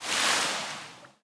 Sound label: Splash, Water; Barotrauma; Subsurface